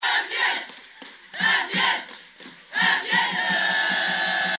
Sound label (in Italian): ambiente